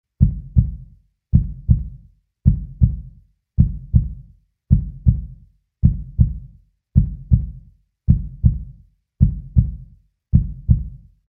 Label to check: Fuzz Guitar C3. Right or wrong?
Wrong